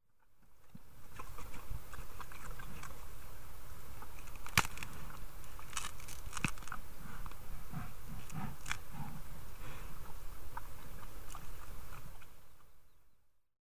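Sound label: grizzly, Yellowstone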